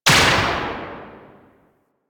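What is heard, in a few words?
machine gun tail; Weapons; Archwing Mach Gun B; Warframe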